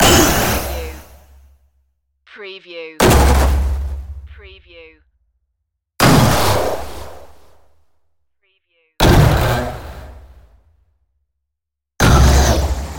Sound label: space battle strikes